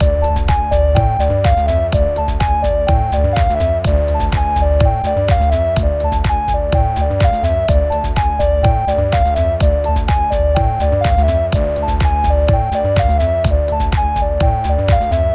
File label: 8bar